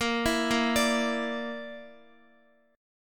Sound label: A sharp Major Flat 5th, Guitar; A#Mb5; arpeggiated; x,x,10,9,10,10